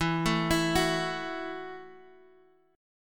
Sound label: F sharp Minor 7th E